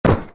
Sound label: mobs damage tool